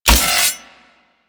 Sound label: Spin Attack Hit; Warframe; Corpus; Arachnoid Micro; Abilities